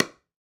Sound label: PickelballPaddle Hit Ball Medium